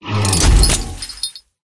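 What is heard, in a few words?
flying armor die; letaushii dospeh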